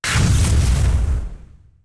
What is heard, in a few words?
Explosion Short